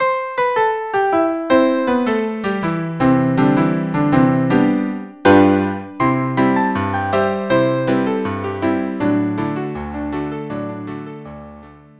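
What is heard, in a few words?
string quartet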